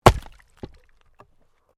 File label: Wood Chopping Axe